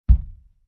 Wood Bang Sound

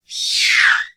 Pterodactyl screech

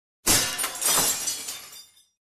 Window Shattering

